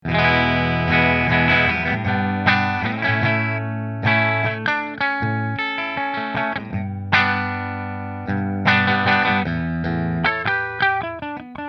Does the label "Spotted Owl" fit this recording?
No